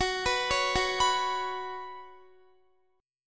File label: F sharp Suspended 4th, Guitar; F#sus4 arpeggiated; x,21,21,18,18,18; 4E,4A,5D,5G,5C,6F; chord shape